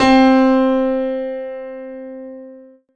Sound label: alesis fusion bright acoustic piano c4